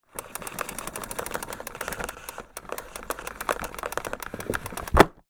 household vacuum cleaner retracting mains cable retract